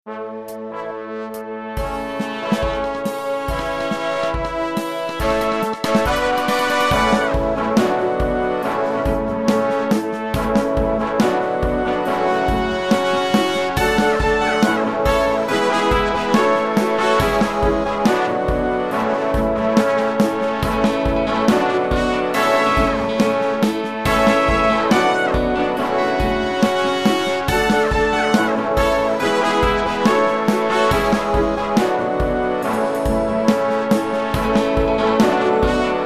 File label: brass Section